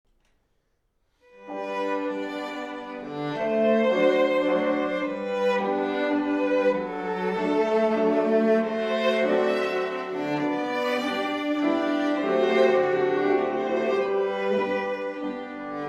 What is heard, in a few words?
Piano Violin Viola Cello